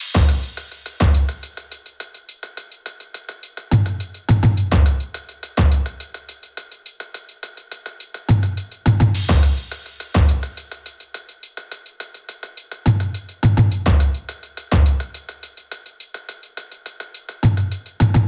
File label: perc, Drum Loops, Top Loops, 105; SD MIDNIGHT Sample Pack; Slate